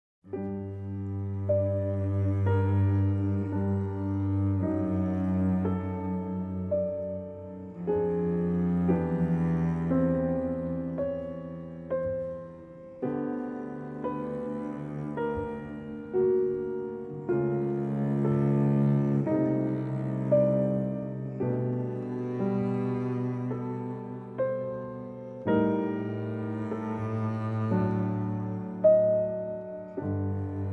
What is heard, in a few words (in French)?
Pause Musicale